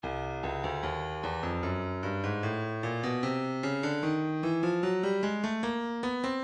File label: breakbeat 075 bpm; Stylus Breakbeat Midi Files 075 079 Bpm; Grave A